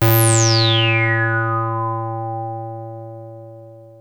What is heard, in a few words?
303 A2, TB 303, Synthesizer; Hollywood Edge; Giorgio Moroder Rare Synthesizer Collection